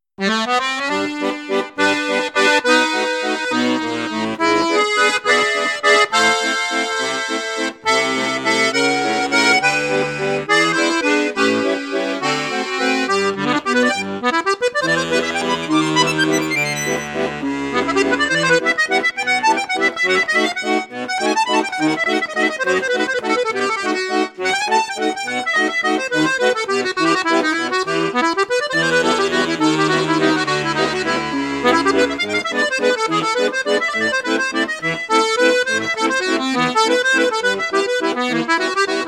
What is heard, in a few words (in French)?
registre flute juste flute haute, accordeon; fratelli crosio